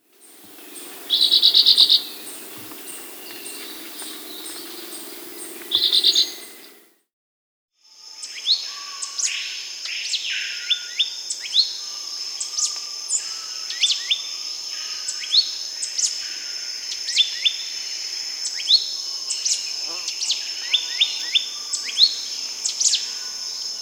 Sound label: Rufous-bellied Euphonia; S. America